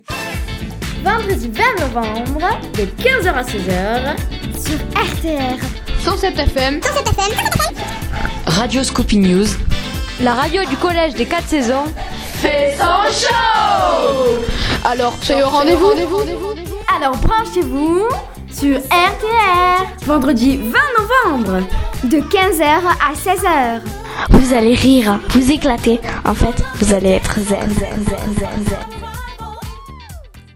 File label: jingle